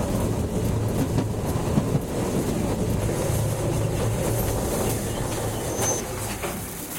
streetcar slow stop; tetsuon

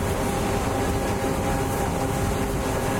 streetcar slow; tetsuon

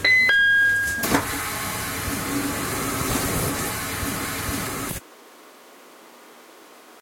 E721 chime door; tetsuon

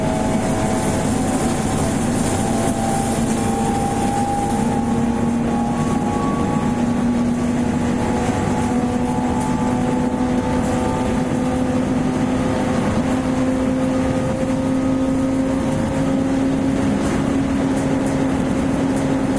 mid top; tetsuon

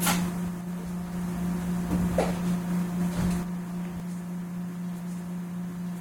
223 door; tetsuon

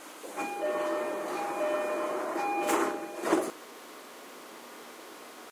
chime door; tetsuon

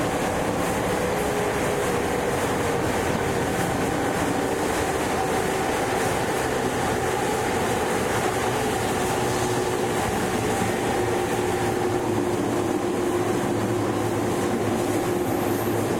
E231 sub middle slow; tetsuon

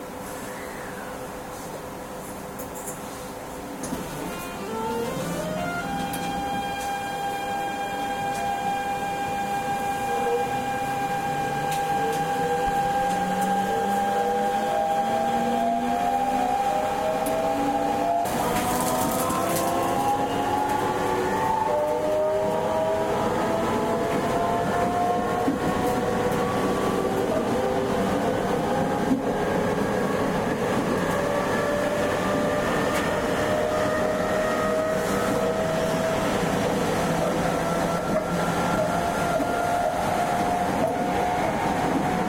E501 go top; tetsuon

